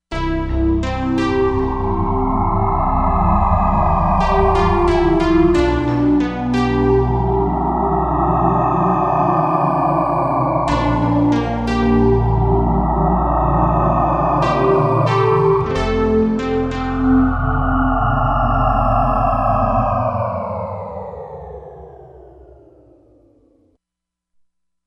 Windy Forte, Korg MSC-07S Synth; Korg MSC-07S Synth 2

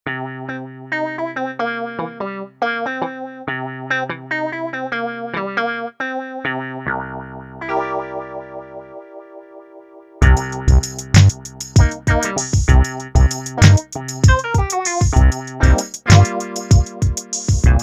Hip Hop Wah Gtr, Korg Radias-R

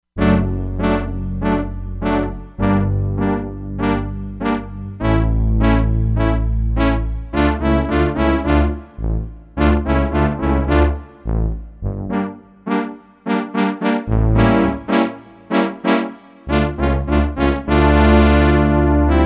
Analo Brass, Korg MSC-07S Synth

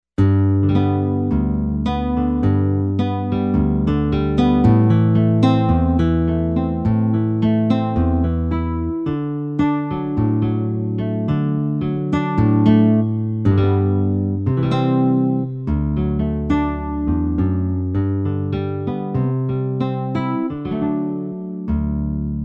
Rosewood Gt

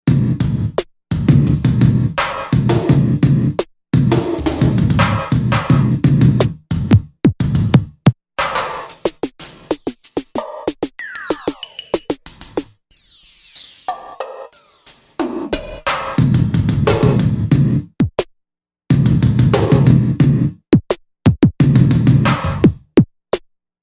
Velo Gated, Korg M1Rex; Factory Patches

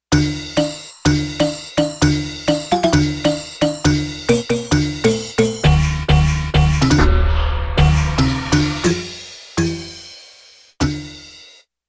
Gated FX

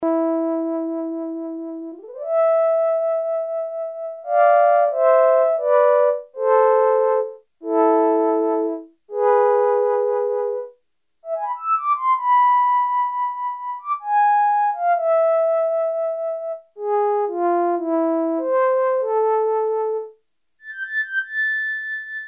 Flutes, Roland Juno-106; Factory Preset

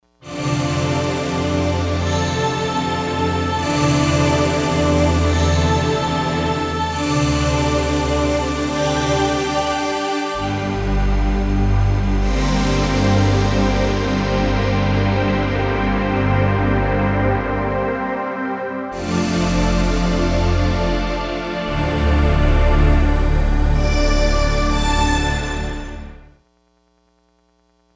Soft Breath; Digital Synthesizer

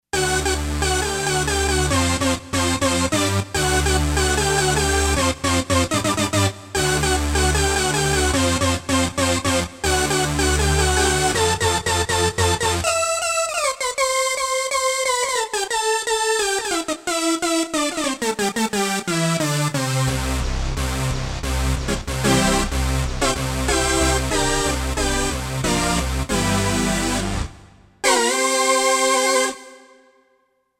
MAD CHO LEAD, Roland SC-880; Factory Patches

